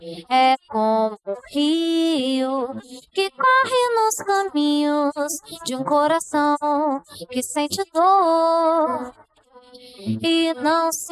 vocals; SiSEC11

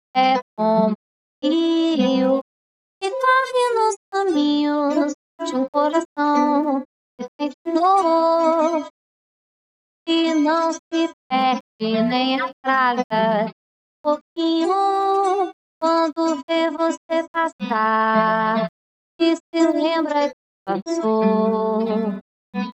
vocals; SiSEC11

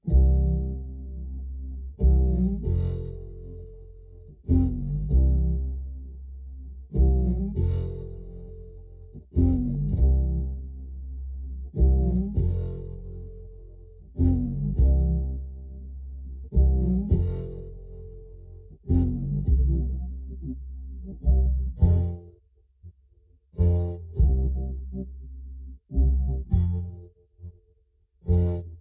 bass

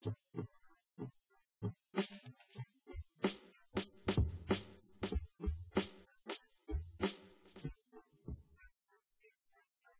drums inst; SiSEC08; SiSEC underdetermined

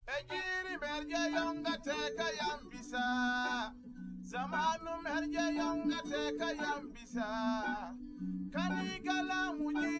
test nodrums liverec 250ms 1m src; SiSEC08; SiSEC underdetermined

